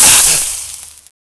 burn

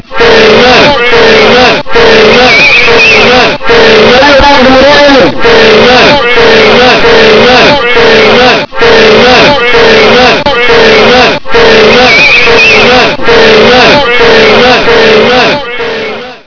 cheering

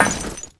jump vent

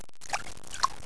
swim

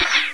xb fire, weapons

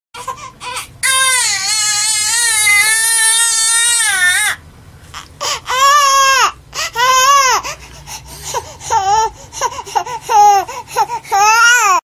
crybaby; ragemap2024

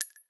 bullet out, Deagle

